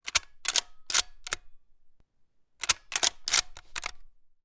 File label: gun arisaka; still north media